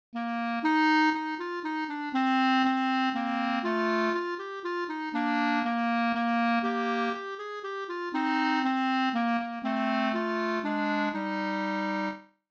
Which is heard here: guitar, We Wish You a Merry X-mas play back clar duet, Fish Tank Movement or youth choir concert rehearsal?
We Wish You a Merry X-mas play back clar duet